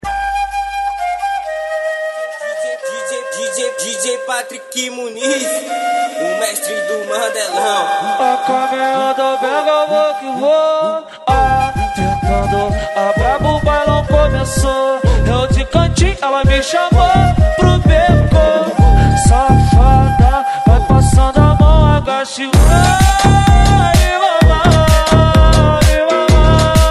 MegaFunk Funk

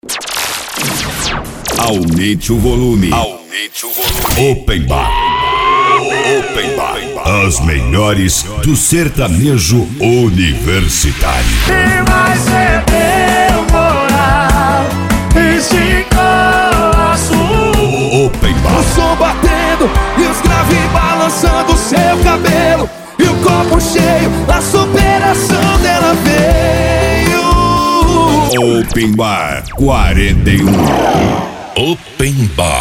Sertanejo

